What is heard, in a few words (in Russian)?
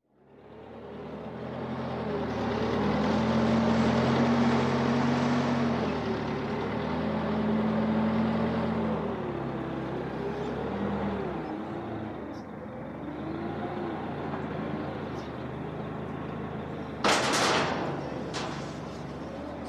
zvuk tjazhelogo pogruzchika